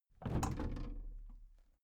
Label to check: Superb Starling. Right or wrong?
Wrong